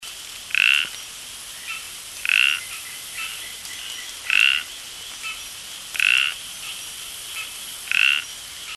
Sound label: Gephyromantis tschenki